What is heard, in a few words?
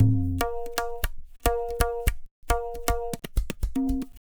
Tabla, 115 BPM; Zero-G; Pure Tabla